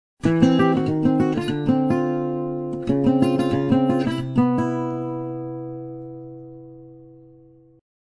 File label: Descending Chord